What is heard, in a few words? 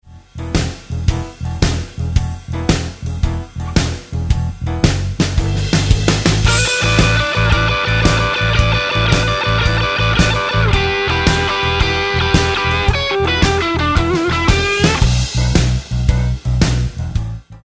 Roadhouse Licks Lick, guitar; 20 Roadhouse Licks